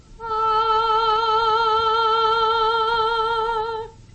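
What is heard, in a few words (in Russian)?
женский голос нежное пение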